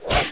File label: Attack; Bali